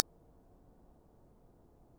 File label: Ambiance Generic